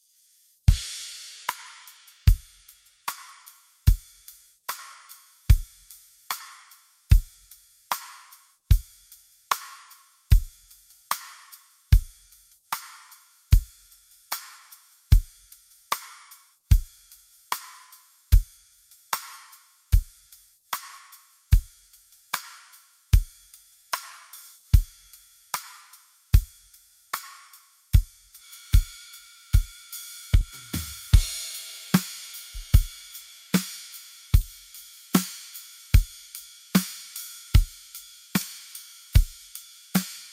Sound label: Drums